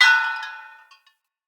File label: Bell Swing Short; Enemy; Corrupted Sproutfolk; Foley